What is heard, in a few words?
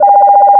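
voice privacy; Samsung SCH-M710 T Omnia II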